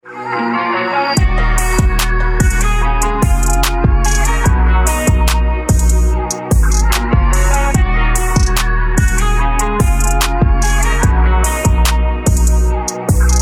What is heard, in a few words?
instrumental ringtone